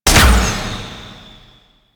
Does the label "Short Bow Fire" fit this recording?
Yes